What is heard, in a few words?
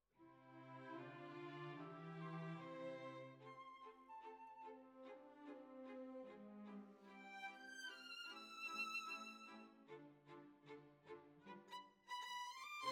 Violins, jazz for piano